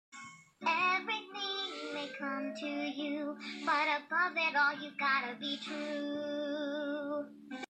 otter singing

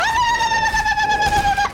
battle cry

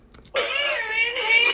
baby yelling perhaps

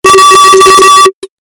loud ringtone

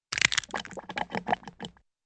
crack egg into bowl

